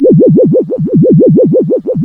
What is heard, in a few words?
C#, Synclav FM, FX; Big Fish Audio